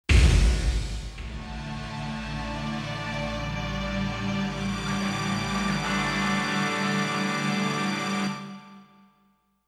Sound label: KO MUSIC; Buriki One: World Grapple Tournament '99 in Tokyo; Final Suddendeath KO & Judgment